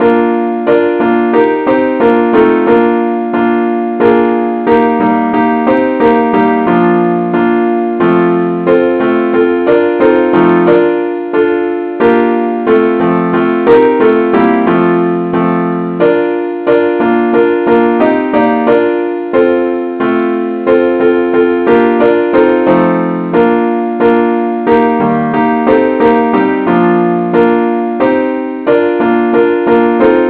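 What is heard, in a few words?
advance accompaniment key B flat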